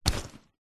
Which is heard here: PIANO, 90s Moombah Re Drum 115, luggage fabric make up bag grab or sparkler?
luggage fabric make up bag grab